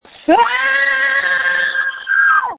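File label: Scream, phone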